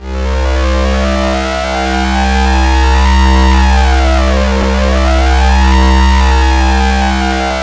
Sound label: SYN LEGEND, SYN MicroWave; Sound & Vision - Gigapack I CD 2